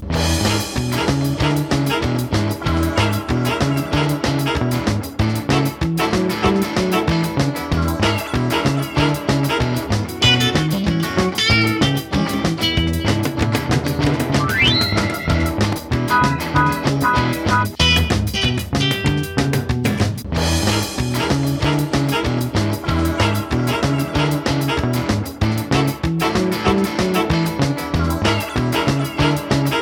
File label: Rock N Roll; Smeshariki Goodbye Winter; Android